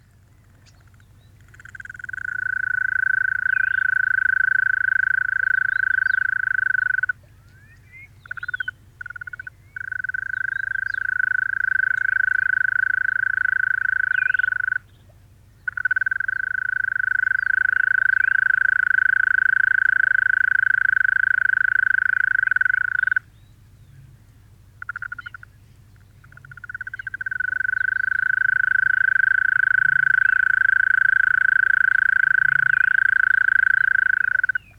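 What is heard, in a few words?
evening sounds of mole cricket; vechernie zvuki medvedki; zvuki prirodi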